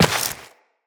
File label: Step Rock, Footsteps, Walk; Tenno